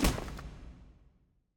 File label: sandstorm Collision; Mountain SFX